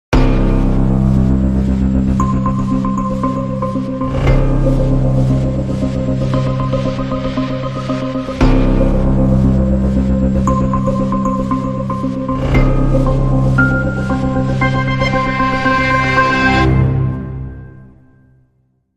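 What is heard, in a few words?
Crime Sting, No Percussion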